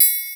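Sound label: open triangle; Crypton - DrumBase5000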